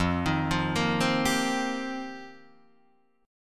F 9th Suspended 4th F Guitar Open D, chord

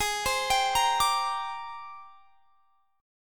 Ab Major Sharp 11th Ab down; AbM#11 arpeggiated

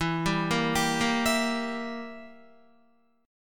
E Diminished E, Guitar; Edim arpeggiated; JGuitar

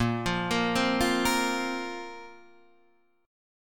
A sharp Suspended 2nd; A#sus2 Chord {6 8 8 5 6 6} arpeggiated

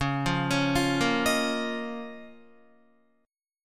Db 6th Add 9th Db down Guitar; Db6add9; arpeggiated

